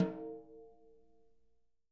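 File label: jazz snares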